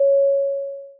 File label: bing